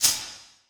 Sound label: shaker, percussion; Sonatina Symphonic Orchestra